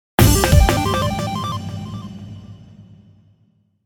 Logo Drum Hits Shimmer Warble; Sonic Logo; 5.1 STEREO MIX; Blastwave

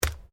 ORGANIC PUNCH WET; Various Organic Foley sounds; Combat Swing Sound Effects; Combat Swings (Sound Effects:Combat)

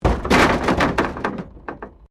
Wreck Door Panel Clunk Metal

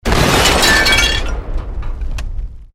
Explosion Medium Metal Glass

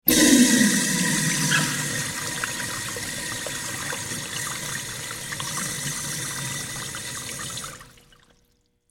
Water Urinal Flush Public Bathroom Toilet; Public Bathrooms

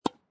Tennis Hit Ball with Racket Distant; Tennis Sound Effects; Tennis (Sound Effects:Sports)

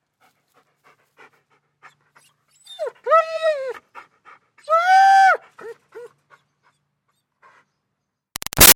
Dog German Shepherd Whine Calling Out; Dogs (Sound Effects:Animals); Big Room Sound

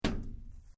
METAL CORRUGATED IRON PIPE LARGE STRIKE

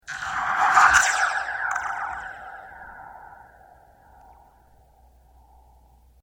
Toy Reverb Spring Strum, Strange Toys; Miscellaneous Toy

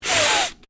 Male Sniff Long; Human male sniffing; Sick

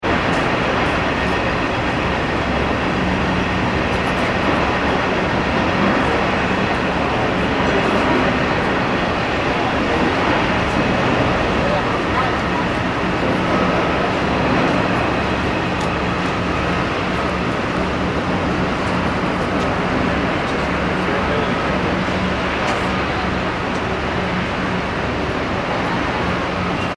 ambience construction site large; Construction Site ( Large ) Ambience - Distant Heavy Construction Noise With Some Pedestri; Ground Zero Nyc; Backgrounds; Construction Site Ambience Ground Zero Nyc 6; McKinney Sound

